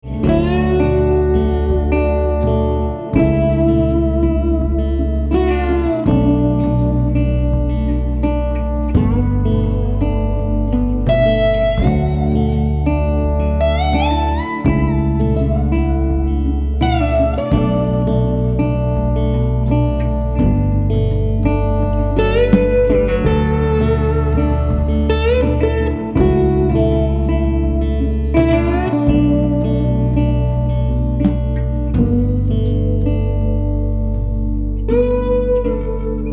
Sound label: Singer-Songwriter Slide Guitar